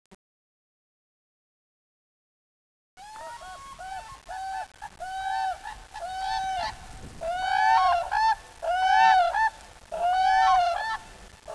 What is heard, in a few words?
crane Unison call; BJohns